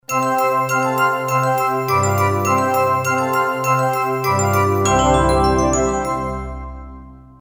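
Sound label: synth bell, Roland JV2080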